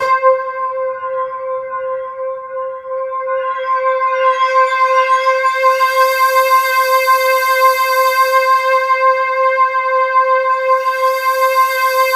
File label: C5, Synth Line; 90 s Sample CDs; NiceBeats; SynthLine VOL-11